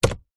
vehicles car wiper stick switch move flick toyota rav4; zapsplat